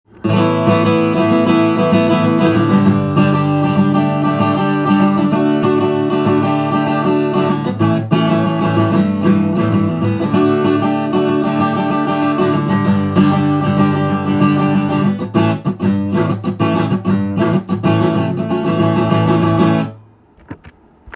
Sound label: yamaha fg